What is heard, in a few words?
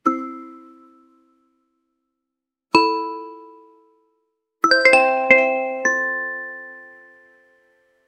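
a short soft and satisfying, arcade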